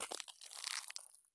walk gravel; Escape from Tarkov

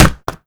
car tire bounce

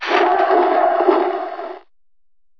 enemy reinf infantry

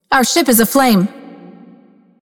Alarms Fire Alarm; Liberty

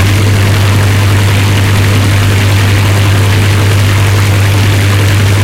mustang side, engines; War Thunder

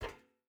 footstep burlap metal walk; Rust

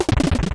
fortress clear; Super Mario All-Stars (SNES); smb3

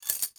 ui drop metal; Rust

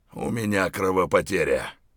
bleed in; Escape from Tarkov

